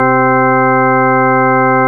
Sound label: HARSH ORGAN; Zero-G; Funky Elements